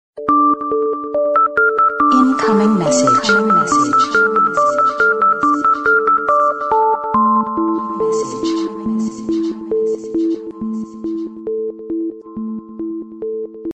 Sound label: tone effect sms ringtone